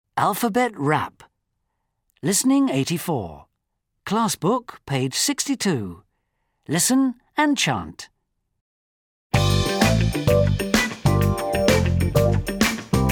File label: Alphabet rap